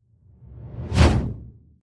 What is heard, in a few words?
whoosh small reverse